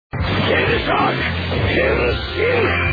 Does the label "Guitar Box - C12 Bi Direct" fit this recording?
No